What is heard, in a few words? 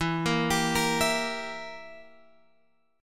E Diminished E down Guitar Open G